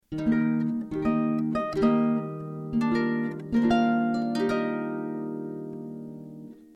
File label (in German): Arpeggio